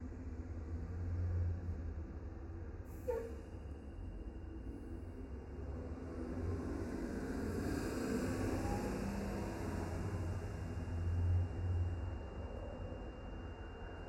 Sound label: bus